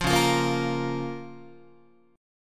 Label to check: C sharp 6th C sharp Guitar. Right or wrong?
Right